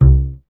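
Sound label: string contrabass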